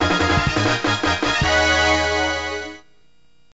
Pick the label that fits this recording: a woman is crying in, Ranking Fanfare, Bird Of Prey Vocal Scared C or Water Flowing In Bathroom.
Ranking Fanfare